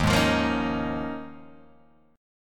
D sharp 9th Suspended 4th D sharp, Guitar Open G

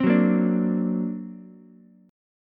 D Augmented 7th Ukulele, chord; 5,6,6,5; strummed; JGuitar

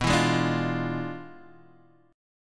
E 7th Flat 9th, B

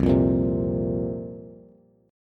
C 7th Suspended 4th, Bass; C7sus4 strummed

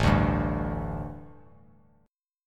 A 7th Suspended 4th A, Bass Standard; A7sus4 strummed

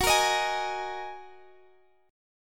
F sharp 6th Add 9th, down, Guitar, Drop D

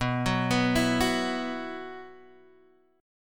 B Suspended 4th, Guitar, Half Step Down

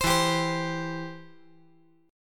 E Minor Sharp 5th E down

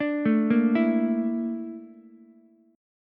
A sharp Major 7th Flat 5th A sharp Ukulele; A#M7b5; arpeggiated; JGuitar

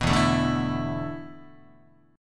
E 7th Suspended 4th, Guitar; E7sus4; strummed

